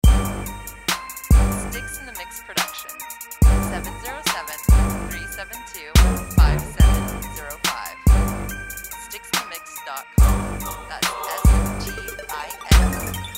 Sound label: Trap on